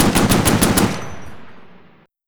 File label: ita light armor mg attack; Hearts of Iron IV Death or Dishonor